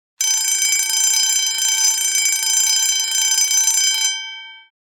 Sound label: Service elevator alarm bell